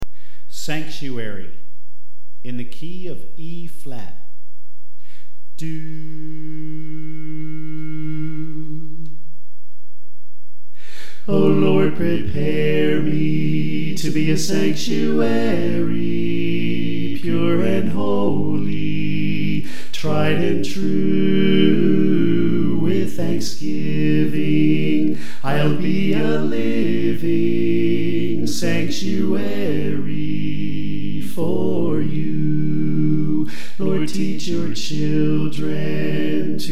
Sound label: Equal Mix